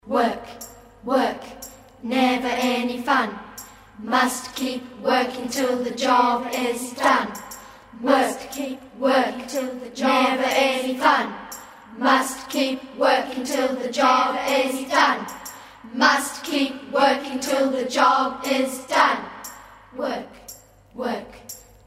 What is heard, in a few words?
Work Chant